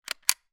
weapon gun ruger 10.22; still north media

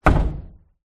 impact wood plank thick against metal garage wall; zapsplat

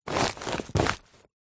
industrial tarpauling bag step on tread foot

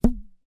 hair elastic band twang; foley

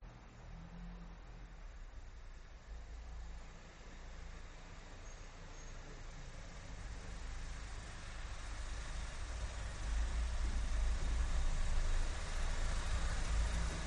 slow drive into garage; detunized

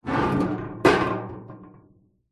household trash can small metal fall over

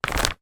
leisure game twister game sheet barefoot lift up; zapsplat

